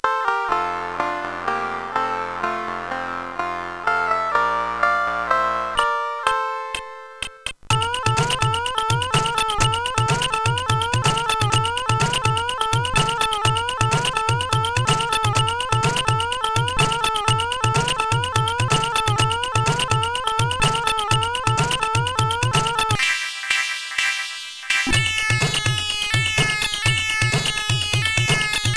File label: Noise, sid 8580; CSG8580R5; hvsc